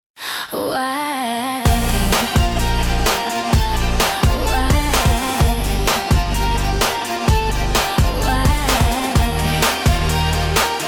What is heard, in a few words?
jingle; wirex